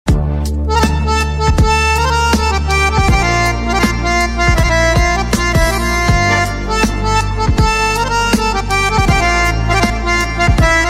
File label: Harmonium